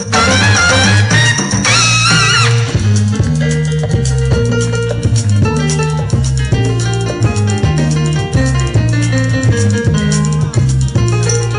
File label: solo piano; zouk